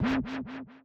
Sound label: windup; ragemap2024; grunt